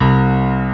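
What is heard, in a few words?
acoustic piano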